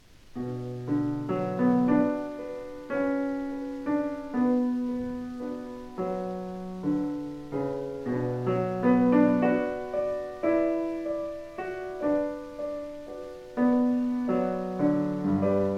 Prelude in B Minor

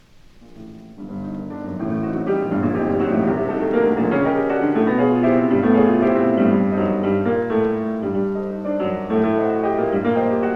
Prelude in G Minor

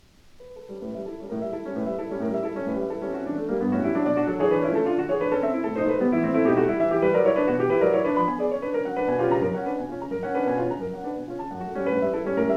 Prelude in D Major

